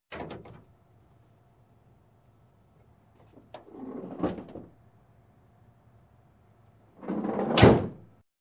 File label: Car Sliding Side Door open and close